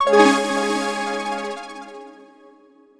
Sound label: ui achievement